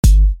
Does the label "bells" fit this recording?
No